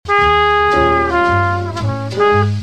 triad

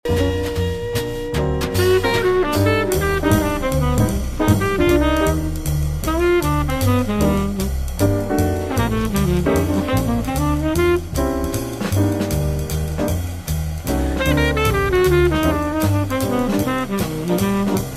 bebop language

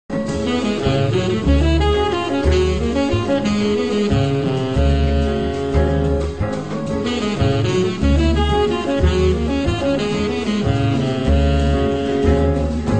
vamp melody

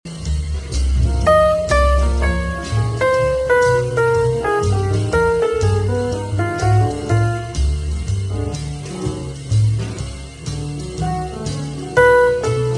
phrase half speed

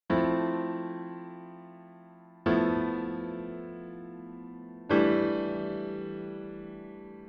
Piano Basics rootless voicing

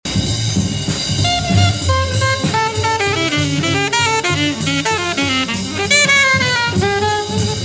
transition line

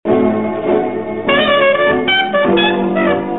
V7 to I

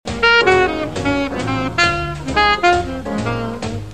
ii V

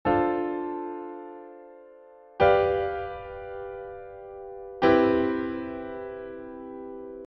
Root position ii V

